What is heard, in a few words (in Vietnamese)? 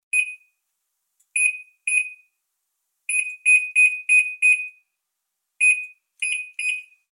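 Tiếng bíp nhấn nút điều khiển đồ điện tử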